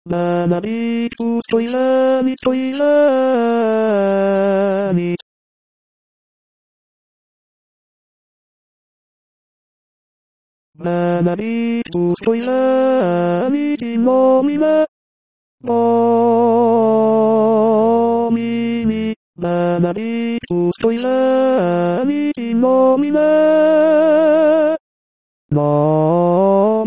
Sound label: Benedictus tenors; Missa pro pace